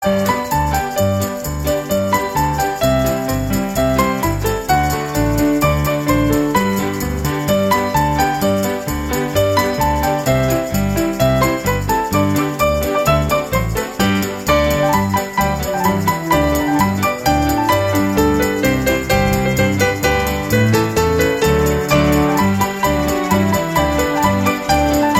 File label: Jingle Bells